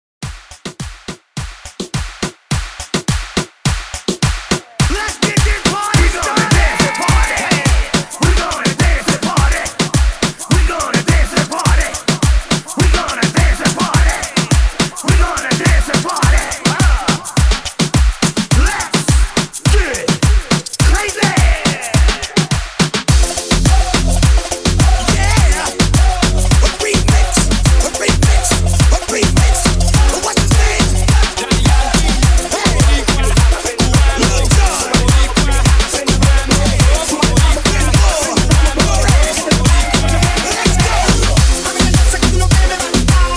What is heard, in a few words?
MOOMBAHTON 105 BPM